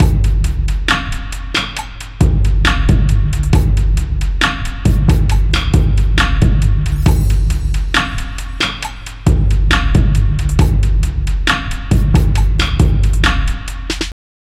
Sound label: Taiko 68BPM; Crowdsourced Sample Pack; Drum Kit; Drum Loops